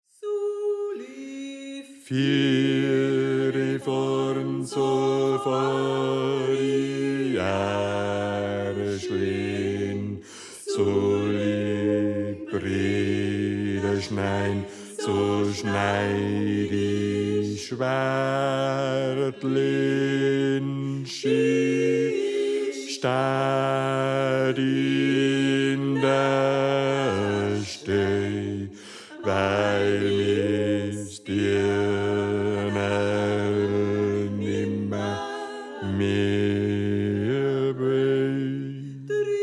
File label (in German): Bass